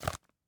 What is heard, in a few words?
paper grab; teardown ps5 windows xbox series xs gamerip 2022